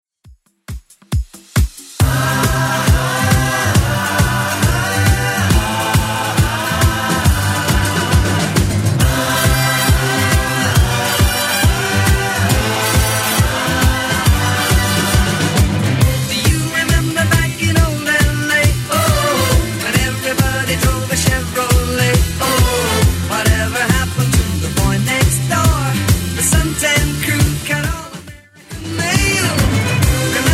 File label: 70s Redrum 137 bpm CLEAN